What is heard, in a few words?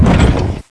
step; svencoop; portal house